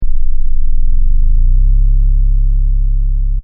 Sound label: Techno Trance Sub Bass Loop In C 142 Bpm